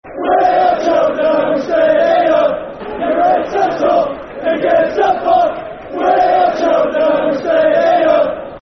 You Write Us Off, Football Chant; Soccer Songs; Cheltenham Town Ctfc

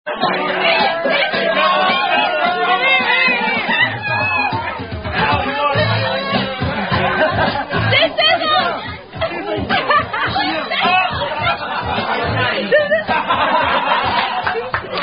Drinking Game; Greatest Game Of Thumper

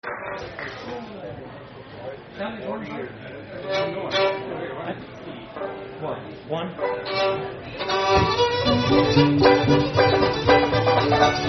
Sallys Hornpipe

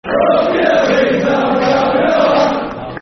Lookin At Leeds, Football Chants (Soccer Songs); Sheffield Wednesday Swfc

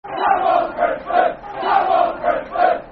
Football Chant (Soccer Song); Come On Brentford; Brentford Bfc

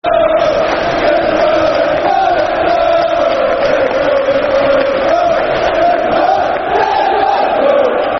Liverpool, Football Chants (Soccer Songs)

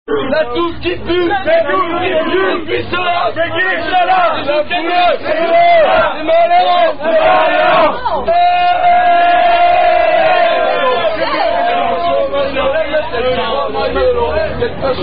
French Rugby Songs; Alloutte

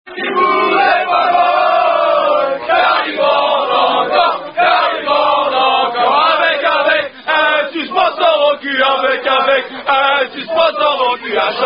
Unknown French Rugby Song; Mais Non, Le Kibu N'est Pas Mort

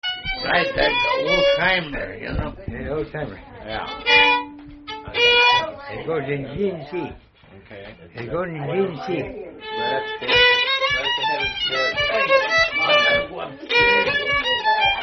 6 8 G D; Nameless Tune

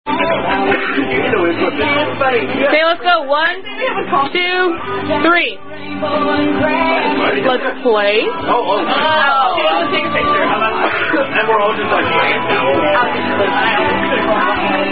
Drinking Game; Zoomy Zoomy Drinking Game (Unusual)

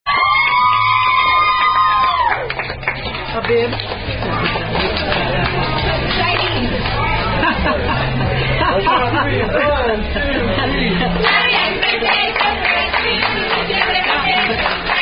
Happy Happy Birthday

